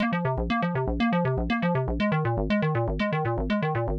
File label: SH101 Arp (120BPM), retro house